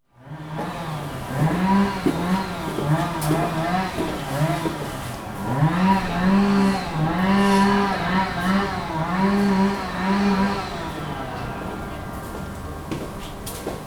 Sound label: blowers; fieldrecs